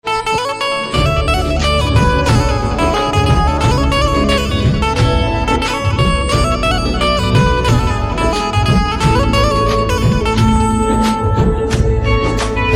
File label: Banjo, instrumental ringtones